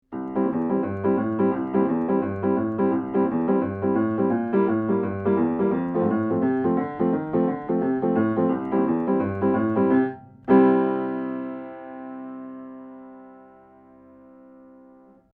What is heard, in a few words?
Cardioid Piano Rock